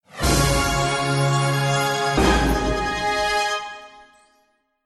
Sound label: big win, slots; Realm Saga